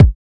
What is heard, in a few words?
old school warm kick drum b key